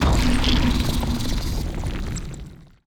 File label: seaborn attack